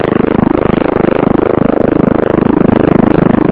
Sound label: engine loop, synth mortar; scmod